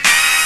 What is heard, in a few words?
High Hit; Proteus 2000; Digital Sound Factory Proteus 2000